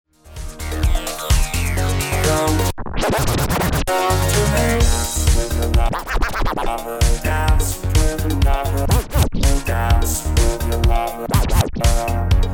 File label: Scratch